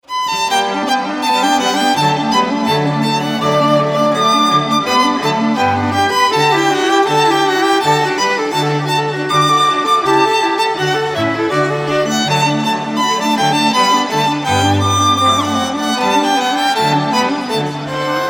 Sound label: instrumental